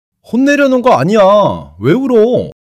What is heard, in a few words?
surprise